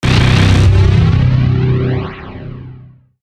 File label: Glitch Hit Phaser Electrical, Glitch Frequency Radio Sweep; Production Element Imaging Element Accent Transition; Blastwave